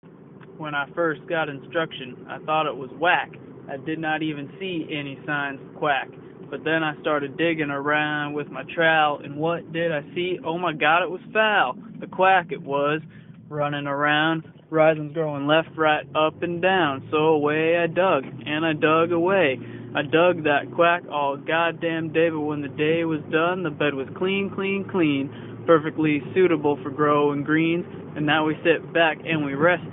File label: Quack Rap